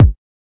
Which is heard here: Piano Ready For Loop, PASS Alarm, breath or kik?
kik